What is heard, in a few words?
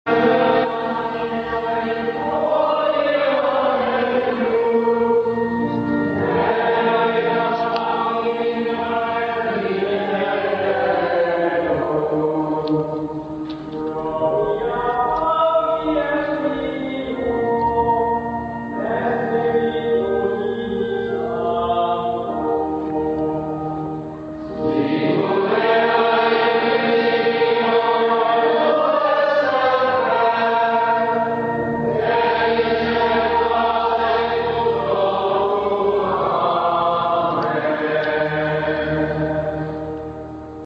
Antiphon; Easter Mon 2011; Latin Mass